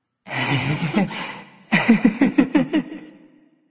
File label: laugh; smite; ahpuch